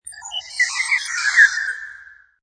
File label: Cyber Magpies, Reverberant sound of group of birds chirping, singing